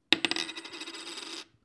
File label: Spinning Coin